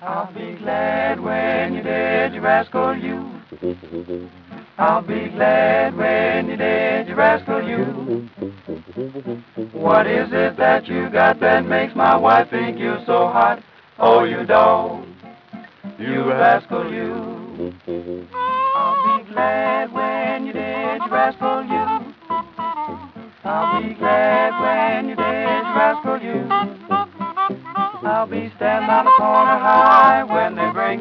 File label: music; Mafie 1